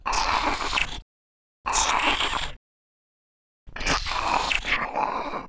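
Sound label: horror monster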